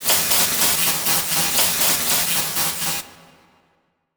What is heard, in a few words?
Title Transition Electrical Pulse; Adobe; Production Element